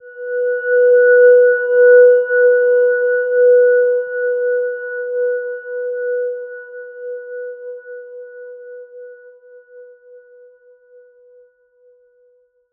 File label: Silver Gem B4, healing soundscapes